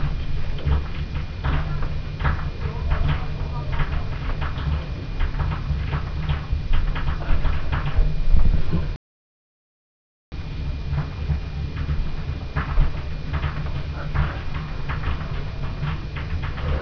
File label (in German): schnalzen, jugend; Freidling